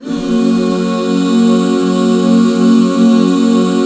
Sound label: GB MAJ, Back Chords; Sound & Vision - Gigapack II CD 1